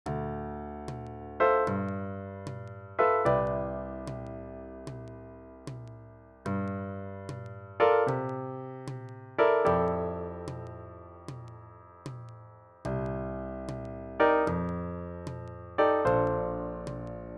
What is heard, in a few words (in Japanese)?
レフトハンドボイシング